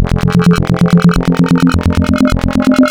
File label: Spectral, wavetables; Architecture 32